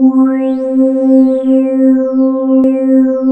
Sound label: JX MELON VOX, ROLAND; Rubber Chicken Software Sample Bank